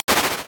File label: door open